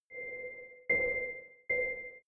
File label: sci-fi creepy sonar short